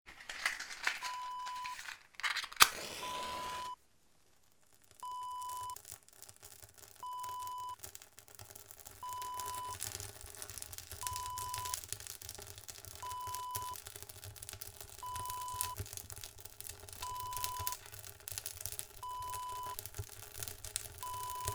fireplace lit up

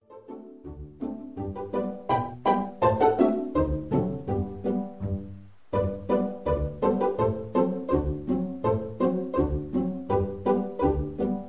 Pizzicato Polka

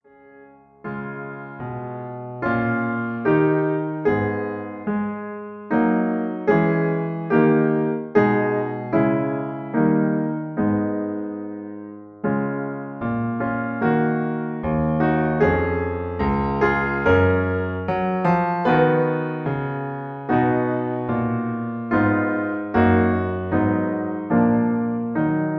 Accompaniment Eflat

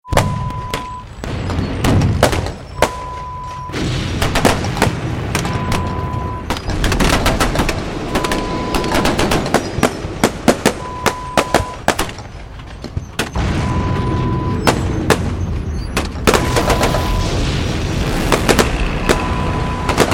Battlefield sound

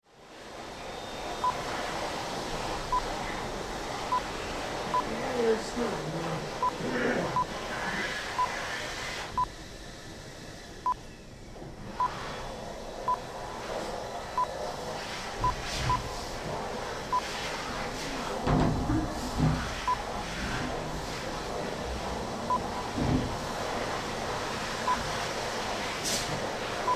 airport toilet ambience

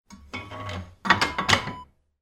wood burning kitchen stove door close

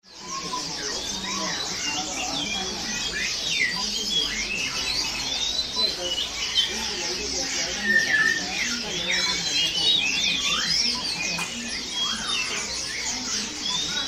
Hong Kong Bird Market Ambience

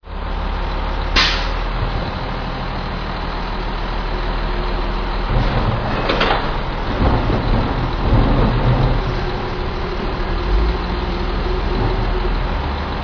garbage truck

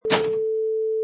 Thin Door Shut

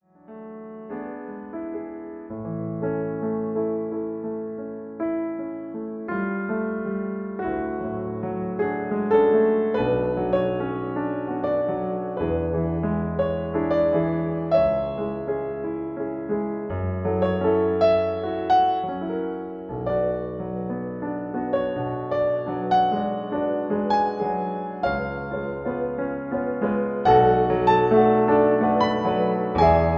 Accompaniment Fsharp

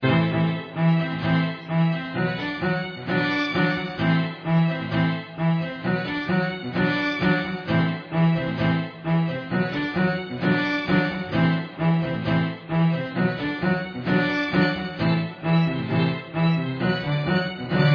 Marching Thriller

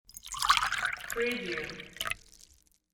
pouring liquid

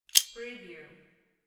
multi plier open

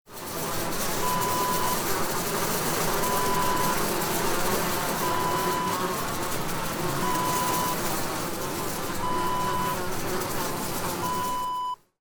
flys

